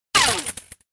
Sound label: lightsaber glitch